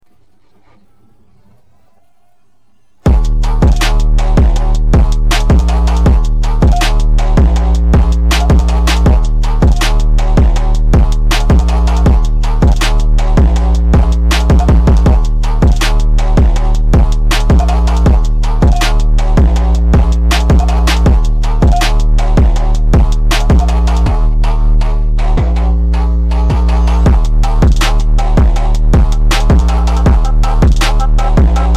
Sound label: no vocals